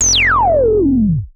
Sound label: SH-09 Tone Drop